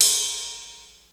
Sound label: ride; xr10